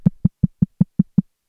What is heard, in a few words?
300bpm; elektron machinedrum